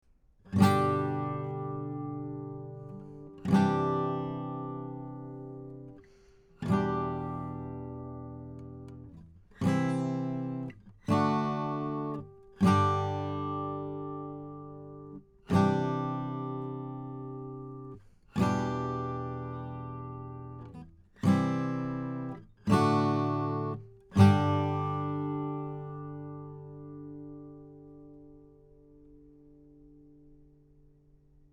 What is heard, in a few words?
MP600800 SS Guitar Chords 35cm from 12th Fret